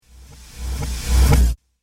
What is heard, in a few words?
Trailer Hit Incoming Power